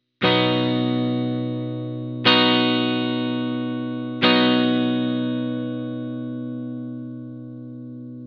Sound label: chord b major, guitar